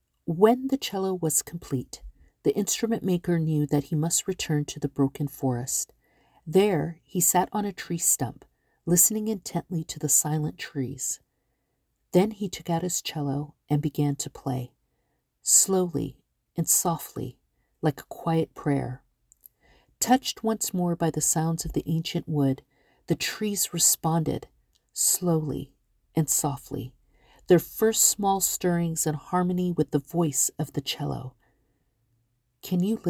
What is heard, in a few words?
Cello complete